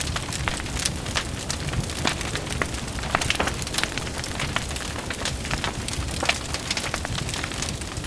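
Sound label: small firewood loop; Star Wars Knights Of The Old Republic I; XBOX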